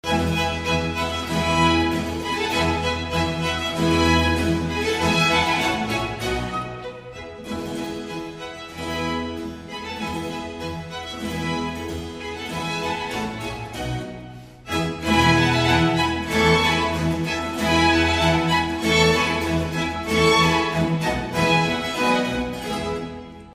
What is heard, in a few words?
Classical